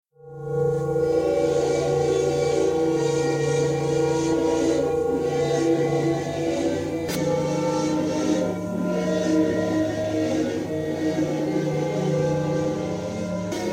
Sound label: ambient; mystic radar